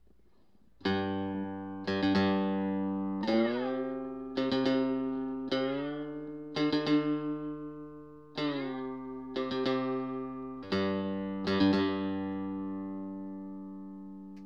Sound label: monte g amped